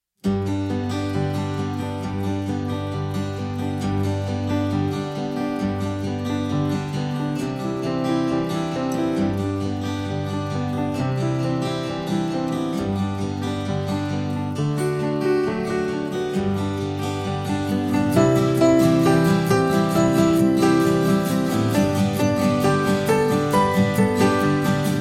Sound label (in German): lied guten morgen karaoke; ich und du